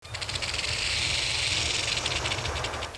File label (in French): Son Serpent